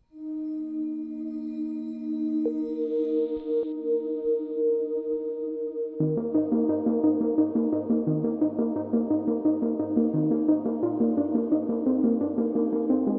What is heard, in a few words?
(Investigative, Dreamy, Building), synth pop modern pop